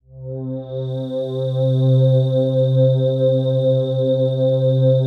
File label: PAD, DIGI-PADS